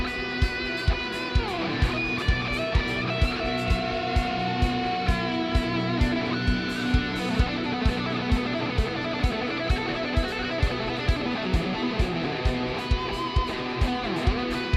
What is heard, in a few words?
Waiting To Drop; apex legends season 12; Fuse